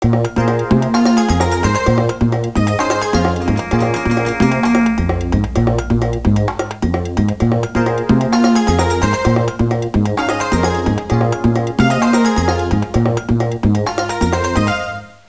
suonerie musica jazz

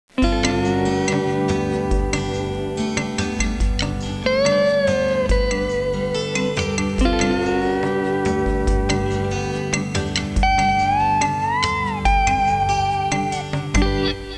suonerie musica hawaiian

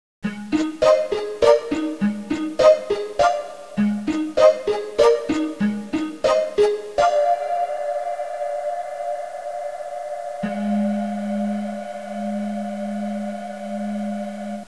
suonerie musica pop

